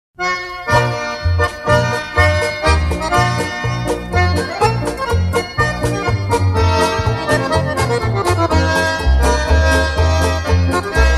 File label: Cross Key Polka, accordions